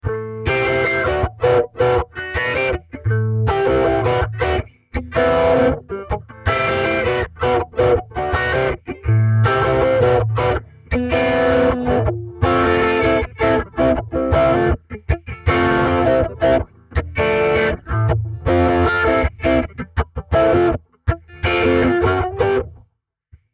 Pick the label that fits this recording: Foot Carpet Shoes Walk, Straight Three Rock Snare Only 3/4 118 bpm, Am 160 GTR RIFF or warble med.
Am 160 GTR RIFF